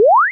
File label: jump